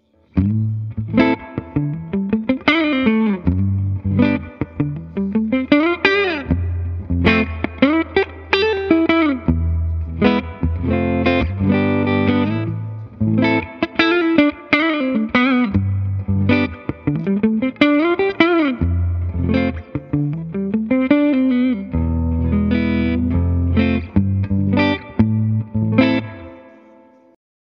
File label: reaper clean; lonestar